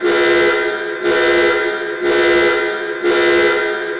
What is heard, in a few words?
warning Siren; Barotrauma; Subsurface